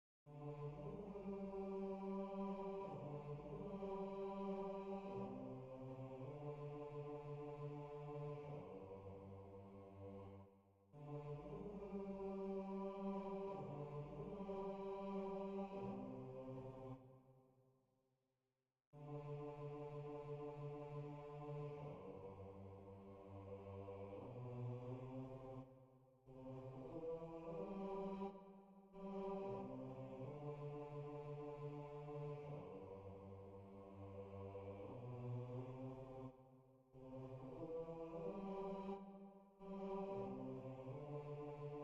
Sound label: Siyahamba Bass